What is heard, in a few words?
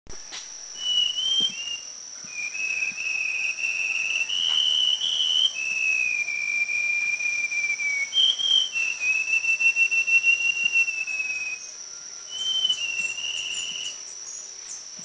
Black Shama Tabunan; Black Shama singing